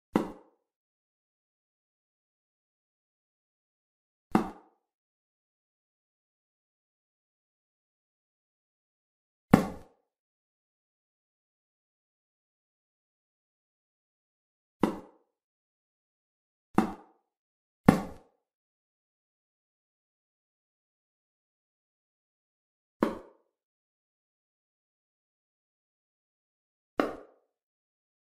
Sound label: Darts